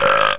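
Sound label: little burp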